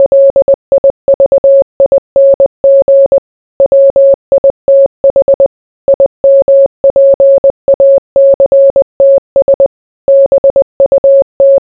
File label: morse